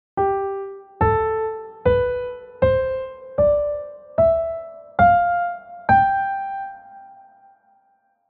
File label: G Major bad